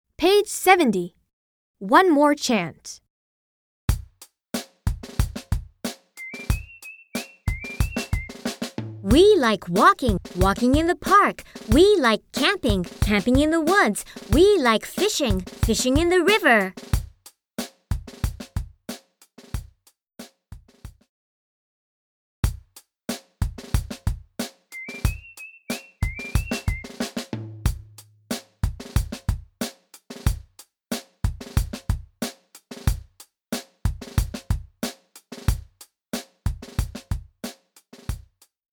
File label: One more chant